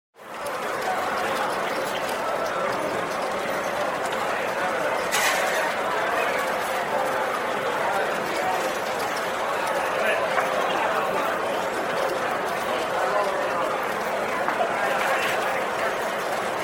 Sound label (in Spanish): ambiente de casino